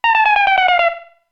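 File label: feeling down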